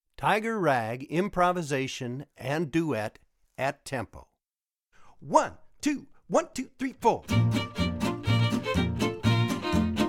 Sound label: improvisation and duet; Tiger Rag, improv & duet at tempo; Jeremy Cohen's Make Your Fiddle Swing! Part III